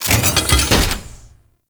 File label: Fortress Turret Retract; Ambience; Gameplay; Warframe; Grineer